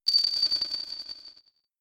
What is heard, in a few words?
ring